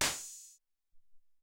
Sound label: Noiz Hat, Alesis SR-18